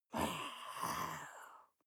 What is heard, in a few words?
witch whisper hiss; kreaturen